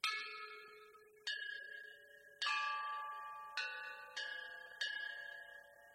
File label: Bianqing